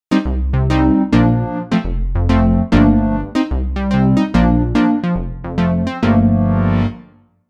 Jupiter neg pluck; opx pro3

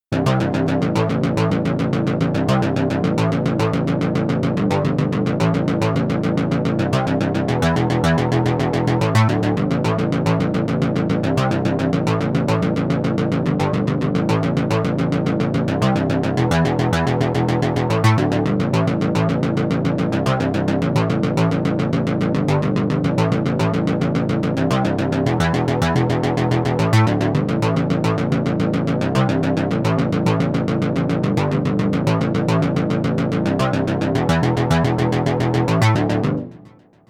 bass

